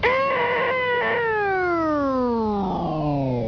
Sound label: he die, houndeye; svencoop; cracklife